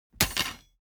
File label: impact scrap drop metal on wood rods bounce